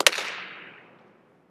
Fieldhouse Clap

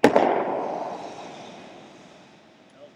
Ziploc

